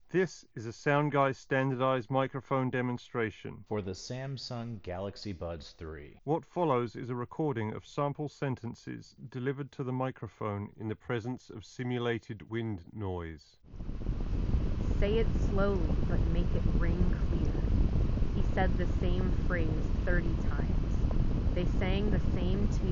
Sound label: Wind microphone sample; Samsung Galaxy Buds 3